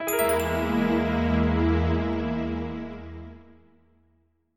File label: Mystery